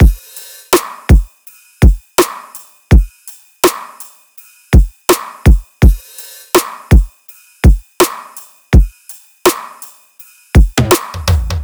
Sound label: Full Drum Loop, 165 BPM; Future Bass; Drums; Heater